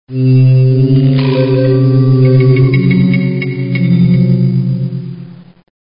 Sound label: spooky sounds